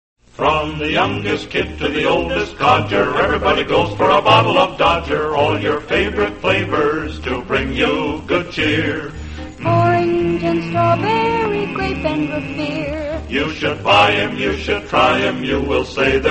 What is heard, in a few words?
radio jingles; dodger beverages; allied radio artists